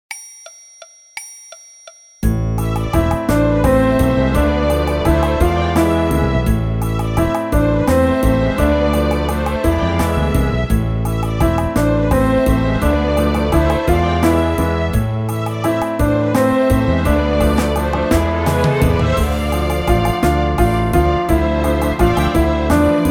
Piano Orch

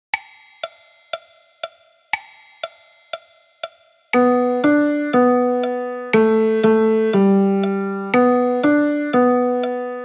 Piano Version

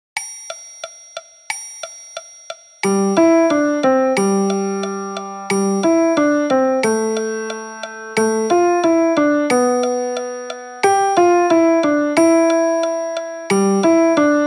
Jingle Bells Piano Version; جینگل-بلز

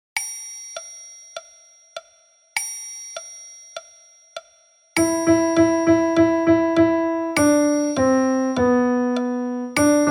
Piano Version

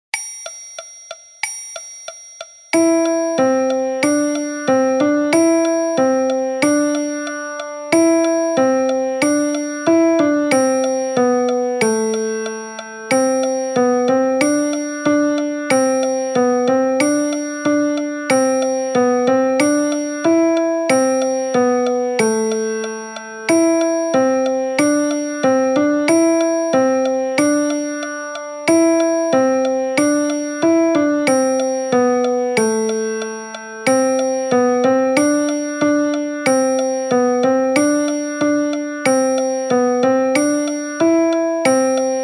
Piano Version

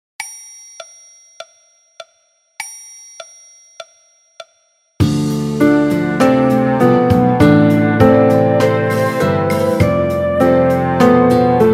Piano Orch

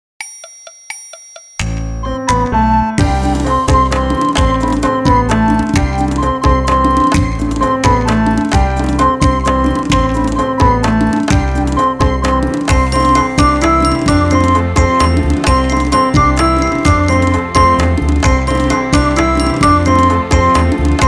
Piano Orch

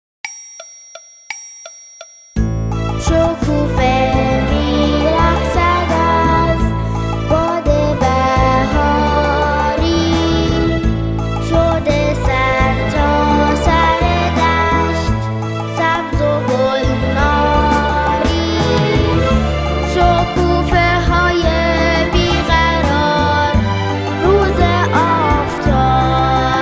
Vocal

